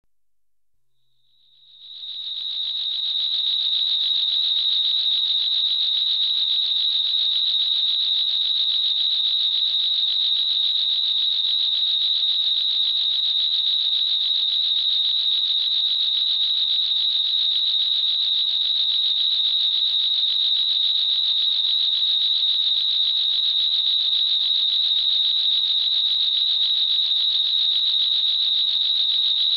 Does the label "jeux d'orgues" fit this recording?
No